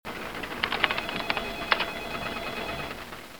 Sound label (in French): Ambiance de bureau; bruitage